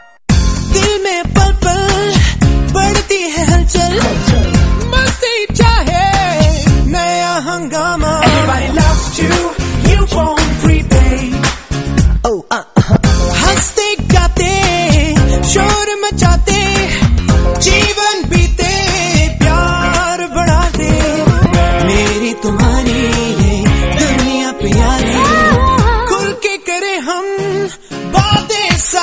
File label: Theme Music; Ufone